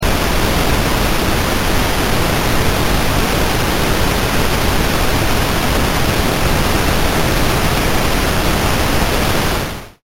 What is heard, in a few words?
silence